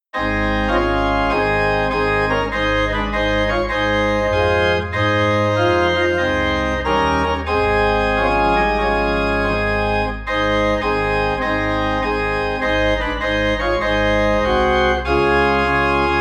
Organ in A Flat

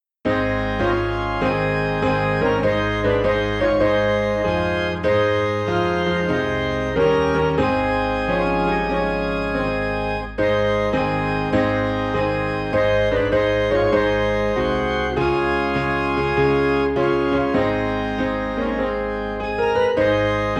Piano and Organ in A Flat

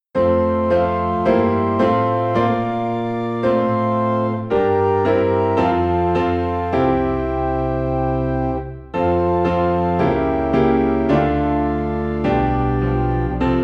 Piano and Organ in F; Like a River Glorious

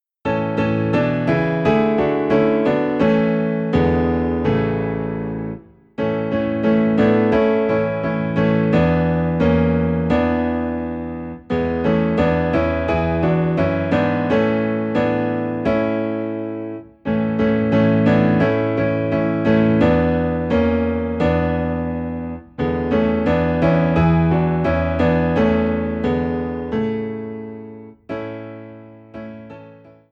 Piano Low in A; Count Your Blessings

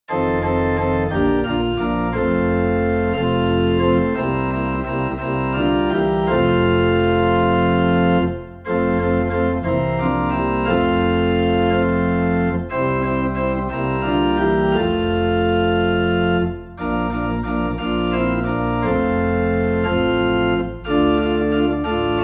Organ Low in F; There Shall Be Showers of Blessing

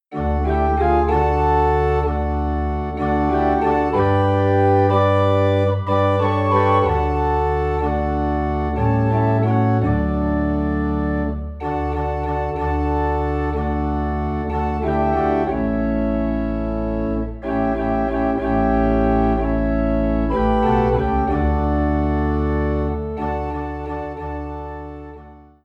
Organ in D; Blessed Redeemer

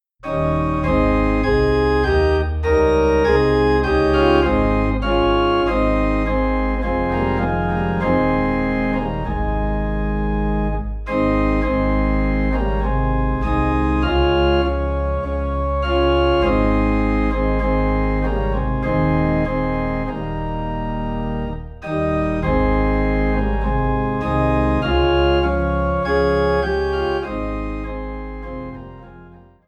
Organ Low in A Flat; He Leadeth Me

